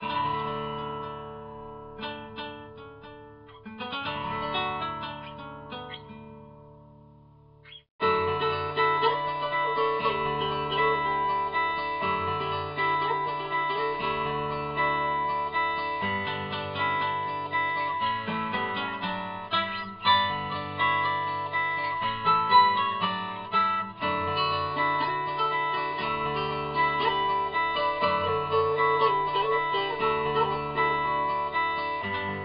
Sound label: radio country, radio EZ Listening; Spider-Man 2 The Game